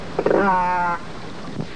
Southern Resident Orca Vocalization; Lime Kiln

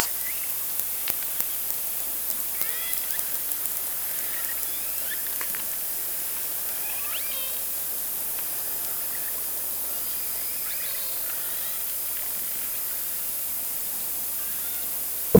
Orca sound Night Passby

